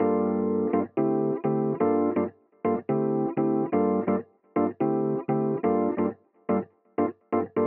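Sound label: electric piano, 125bpm Dm, Nu Disco; Zero-G; Construction Kits; Speedy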